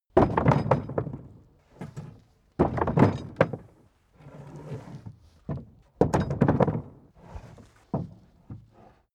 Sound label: carriage hatch sequence